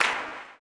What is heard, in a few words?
CLAP, blues & soul; ILIO; Vocal Planet